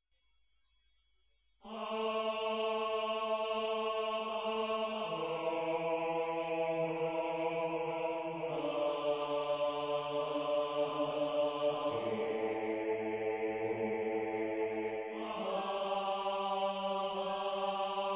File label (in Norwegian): bass jul sang